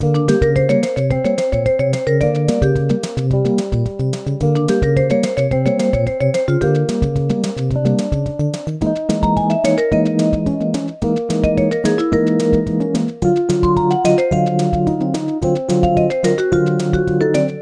MUSIK; ZOOM; MOBICLIC 75

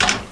Sound Schrank Zu

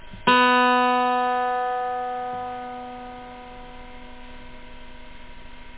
H Saite, Guitar; Guitar1.01

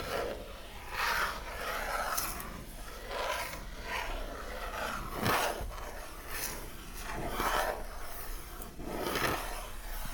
Maus Schlitt

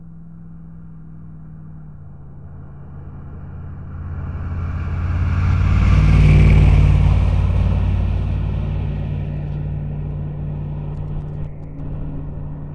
lkw

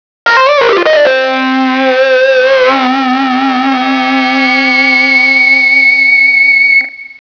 E Gitarre

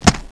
Plumps

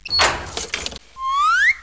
Schlüssel

